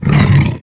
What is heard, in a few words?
pain; svencoop; balathron